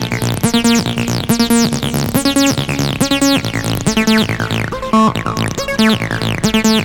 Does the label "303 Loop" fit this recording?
Yes